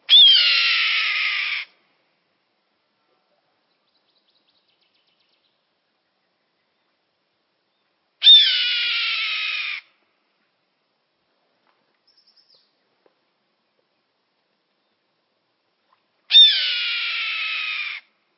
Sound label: Red-tailed Hawk; Birds